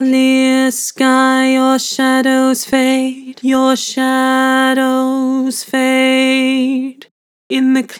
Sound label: Vibrato, Vocals; Shadows fade; Harmor Vocals